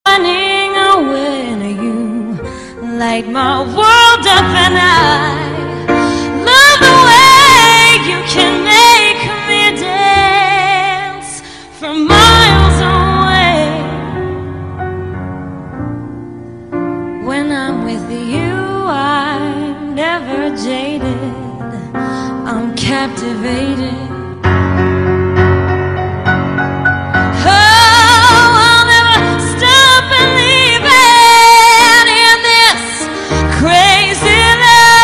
acoustic

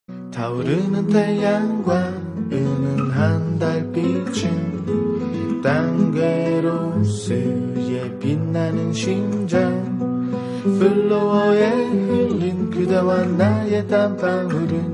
tango

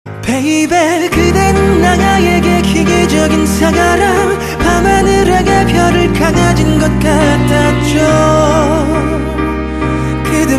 piano

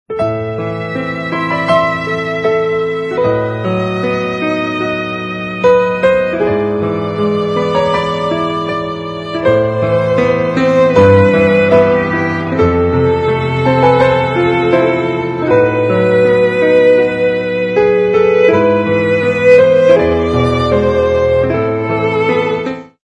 violin

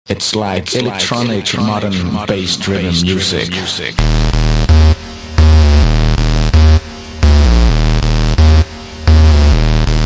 bass driven music

